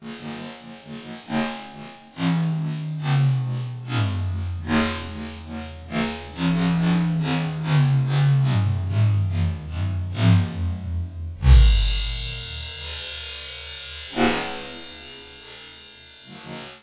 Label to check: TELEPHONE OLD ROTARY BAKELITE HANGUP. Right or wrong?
Wrong